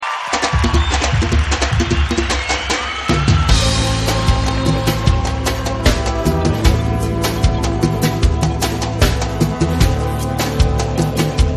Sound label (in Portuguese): ao vivo em Salvador